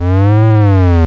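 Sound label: hyperspace, video games; solarq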